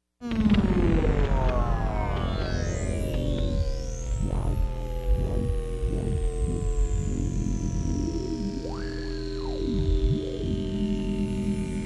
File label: space intro; Black Eye And a Fat Lip